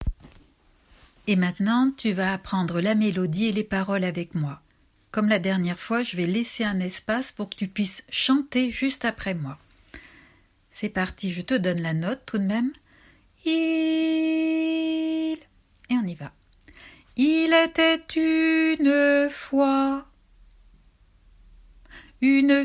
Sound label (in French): paroles et mélodie